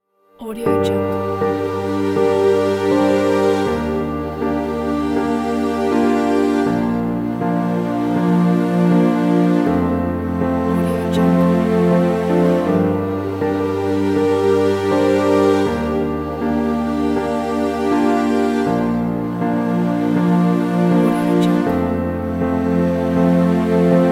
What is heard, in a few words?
epic inspiring orchestra